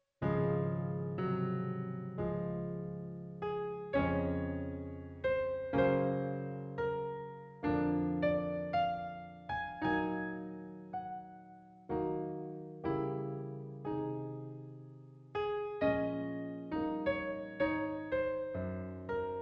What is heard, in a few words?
piano; pour enfants tous ages